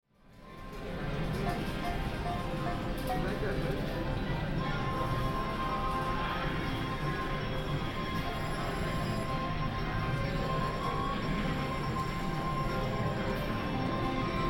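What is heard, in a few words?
crystal palace, soundscapes, bahamas; Heaven & Hell in Paradise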